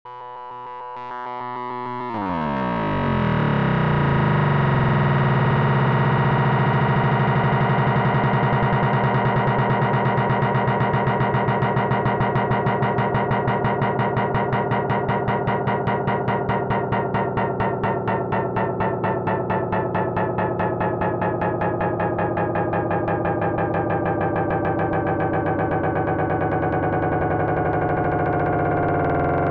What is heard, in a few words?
Engine